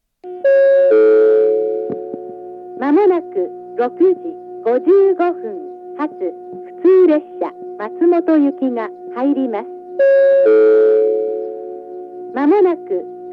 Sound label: up local; azumi-oiwake; matsumoto; jr-east